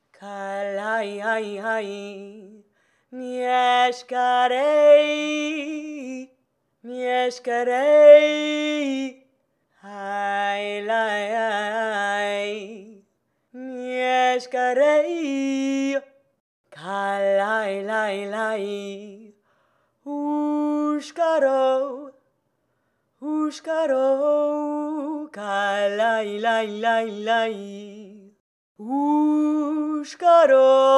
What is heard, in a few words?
call; Hollow Knight: Silksong